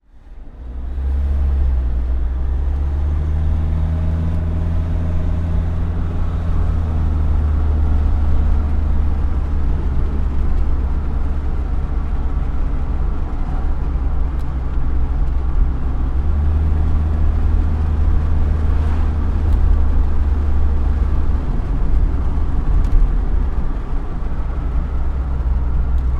transport car suv away drive corner hard tyres screech accelerate internal window; windows open